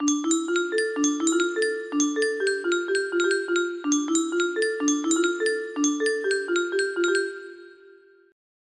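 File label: music box melody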